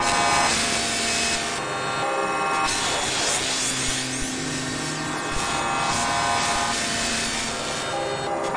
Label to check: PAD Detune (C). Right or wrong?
Wrong